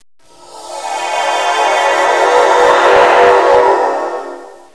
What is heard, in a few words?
transporter